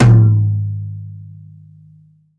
reverb tome drum sample G sharp key, Royality free tom drum one shot tuned to the G# note.